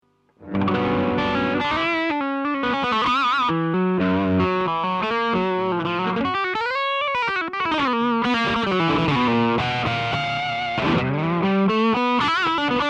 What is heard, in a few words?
Custom Custom drive